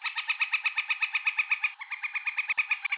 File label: flicker northern